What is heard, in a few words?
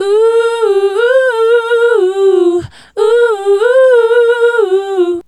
Female Group, Vocal; Sonic Foundry (Sony Creative Software); Vocal Toolbox